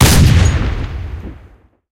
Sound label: ns45 singleshot, guns